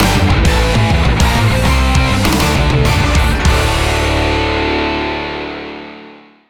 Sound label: BGM Mission Success; 2007 wii; naruto clash of ninja revolution